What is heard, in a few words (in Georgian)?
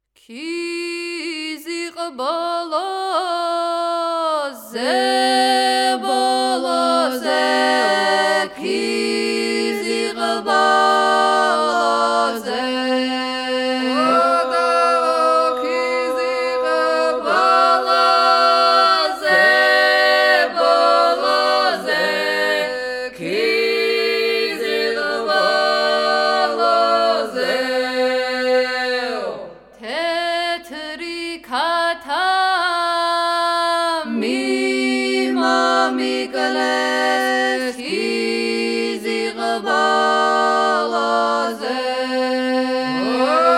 ქიზიყ ბოლოზე მოხეური; გალობის უნივერსიტეტი; სასწავლო